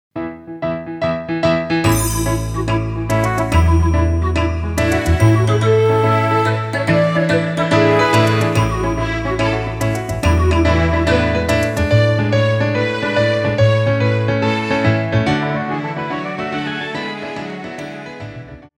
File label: Medium jumps, Ballet